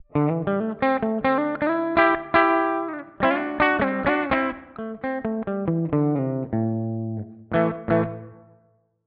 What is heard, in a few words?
Marshall Blues Breaker Clean